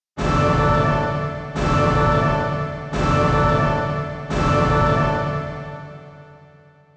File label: Hit wet